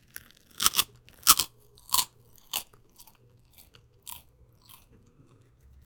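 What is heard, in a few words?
sloppy eating sounds crunching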